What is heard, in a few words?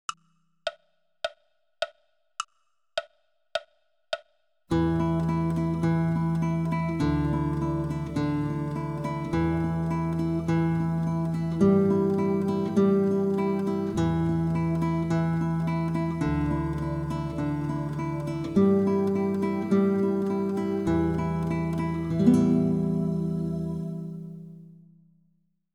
mini tremolo; Nicolas de Rigal